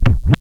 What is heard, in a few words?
Scratch In, vinyl record